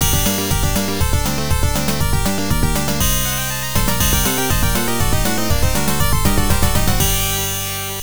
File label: Rostrum Music; Wacky Wheels; MS-DOS